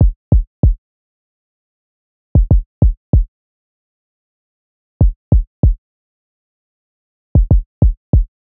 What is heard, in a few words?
Drum Loop, 96 BPM Kick; Cymatics; Vibes II Drum Loop Collection; Cavern Drum Loop